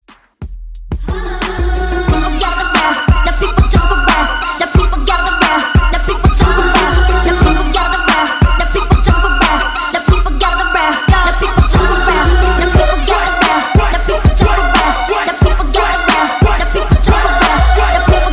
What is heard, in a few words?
HipHop Ext. Dirty 90